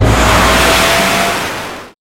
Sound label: Boost; Rally Champ